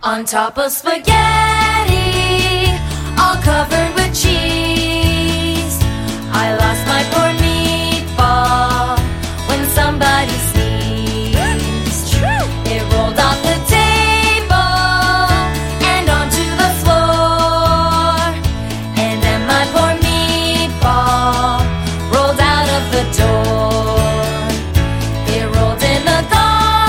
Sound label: song music